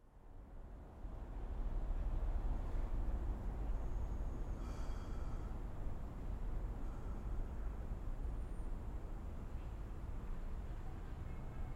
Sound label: tram